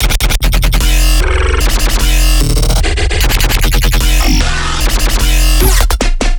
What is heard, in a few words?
Bass Loop F, Dubstep, 150bpm; Transformer; Ghosthack; Dubstep Sound Arsenal